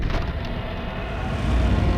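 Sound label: Music Volcano 120; From Dust; ps3; xbox 360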